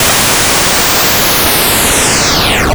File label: C64 Ship Landing; 8-Bit; Chiptune; Commodore 64; FX